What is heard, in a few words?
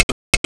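double click; New Perspectives on Computer Concepts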